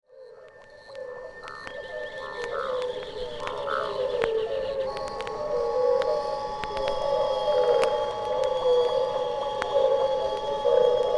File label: birdsongs